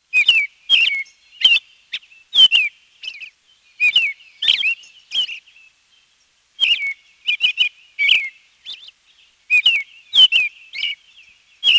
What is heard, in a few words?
american robin